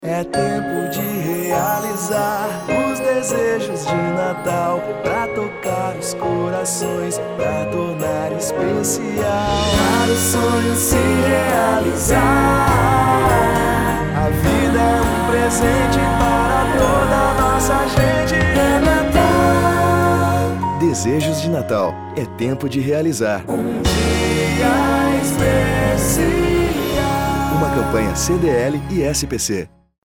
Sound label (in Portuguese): Natal Jingle